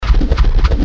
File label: Spinning